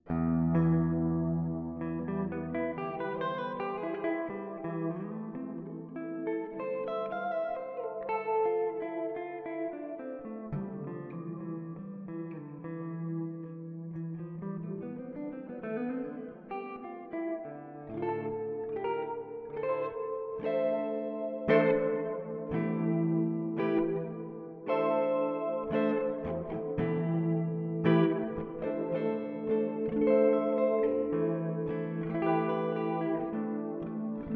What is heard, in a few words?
Electro Harmonix Oceans 12 Mod Auto Inf